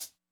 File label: hihat, Acoustic